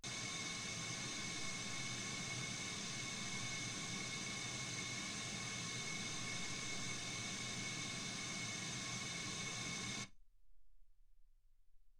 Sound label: wgn